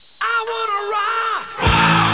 rock; svencoop

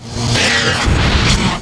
transport; darthmaps

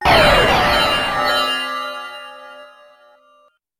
black hole; svencoop; thearena

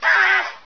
raptor small flinch

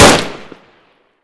m16 fire, weapons; m16a1; hunger

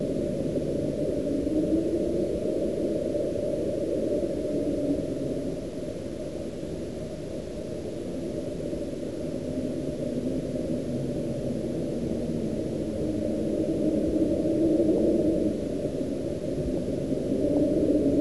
spooky wind apartment; svencoop

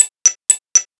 tick tock

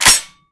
shotgun stock release; weapons

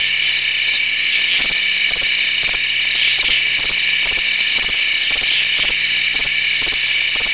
crickets, ambience; svencoop

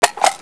FG42 clip out, weapons; dod

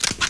m16 clip out; firearms

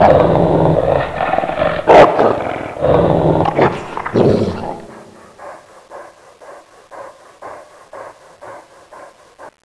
zombie dog idle

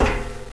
grate

